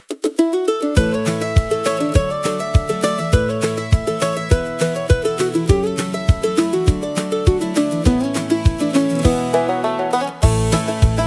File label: Wide Open Morning, country acoustic for nature and refreshing